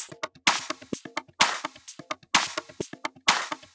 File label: Loops 128BPM, Drumloops